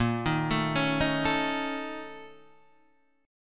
A sharp Minor Major 7th Double Flat 5th A sharp Guitar DADGAD